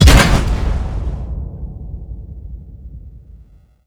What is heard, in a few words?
biomech land hard